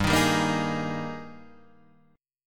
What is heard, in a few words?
G sharp Major 7th Suspended 2nd